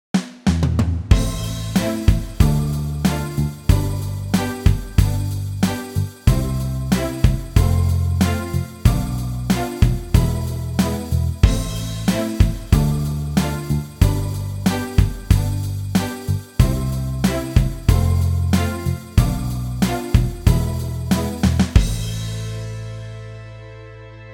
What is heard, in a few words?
Rhythm Jam Track